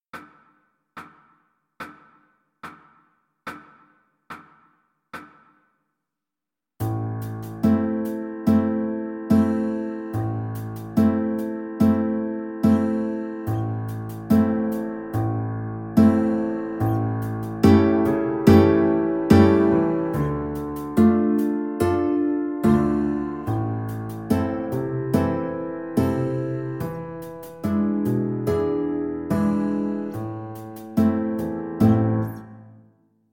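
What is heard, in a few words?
recorder karaoke; bella ciao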